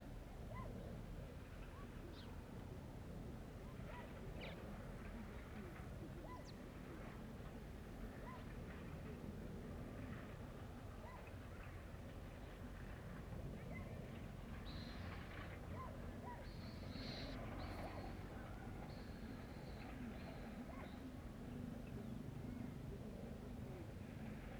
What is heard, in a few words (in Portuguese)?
Ambiencia cidade pequena em fim de tarde com cachorro, passaro, carrinho; carrinho passando